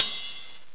ride bell; gmplay; drums

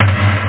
bash; 8,363 sample rate

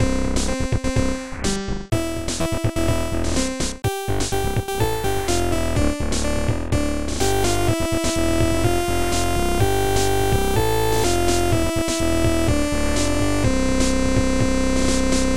warp; equalizer-bbs-collection; DEMOSCENE

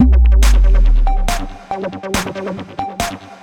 groovebox

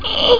EEEE; AVX demo

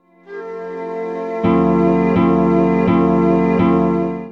MELODY; Chillout; Future Music

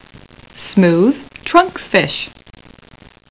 Sound trunk

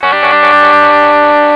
guitar, string instruments; Sound Effects for Multimedia - Volume 1

